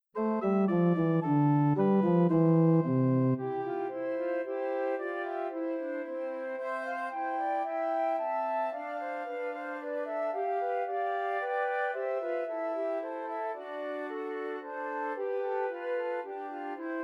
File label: Trios for Three Descant Recorders